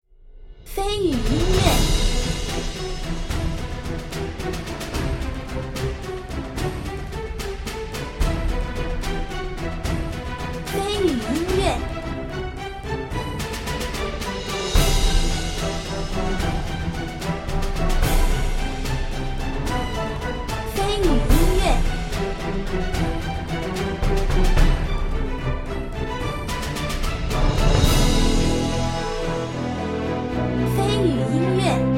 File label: battle day